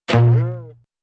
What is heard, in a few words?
harp boing